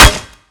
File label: new hit; slender; cof